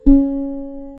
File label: a clean dry audio recordi, handpan